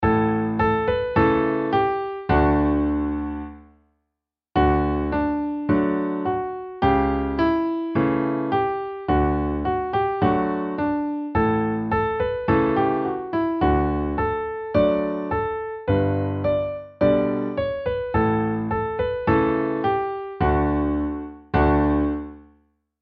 violins cowboy

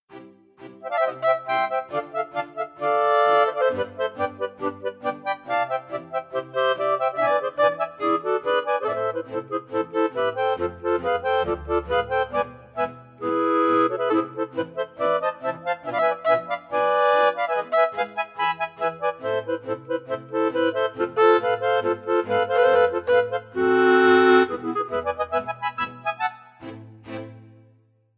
drummer; schweiz

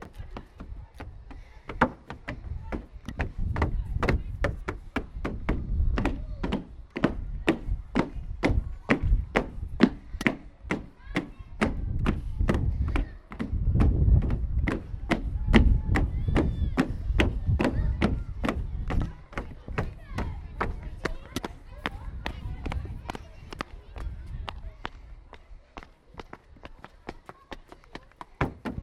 footsteps

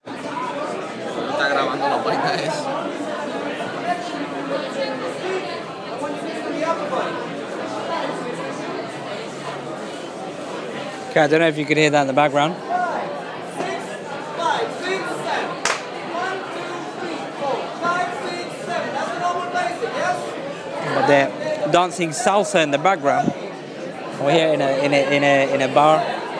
at a london salsa bar

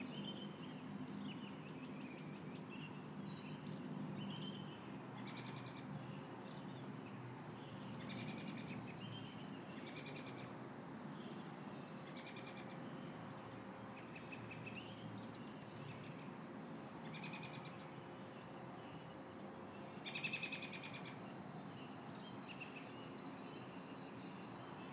sound of spring plus a car

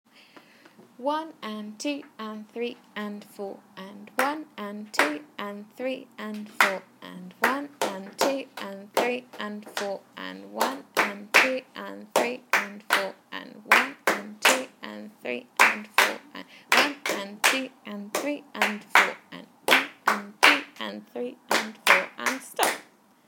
rhythm

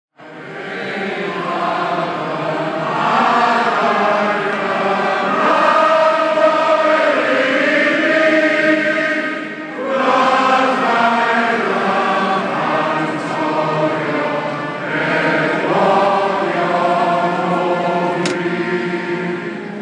Welsh national anthem Mae Hen Wlad Fy Nhadau

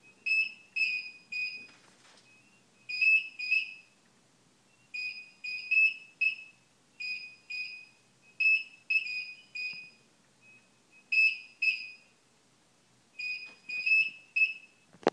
exit signs chirping

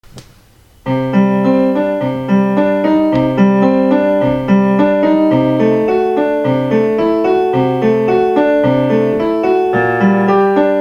on the piano

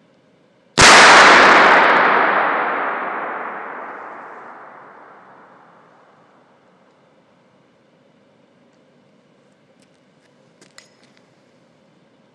boooom

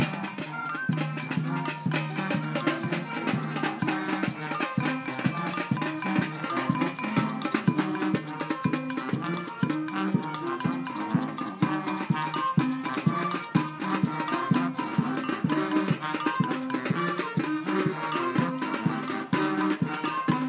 caribbean samba orchestra rehearsing

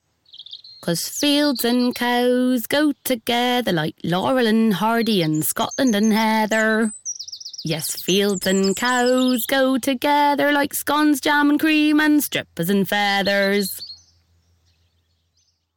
singing cows fields

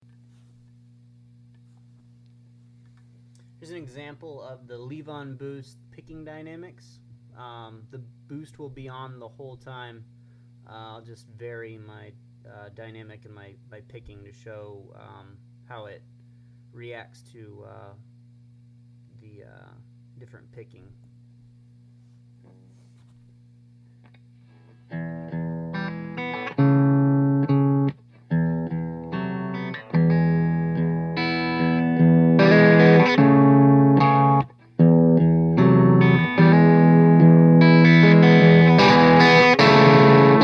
boost picking dynamics

